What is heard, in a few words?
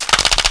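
win curtain; Easy Phonics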